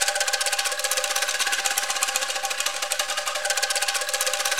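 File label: grate, African shaker FX; Roland LCDP14 Africa VOL-2; Perc FX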